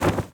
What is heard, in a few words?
Cape Open For Cast; Hollow Knight; Grimm Cape Open For Cast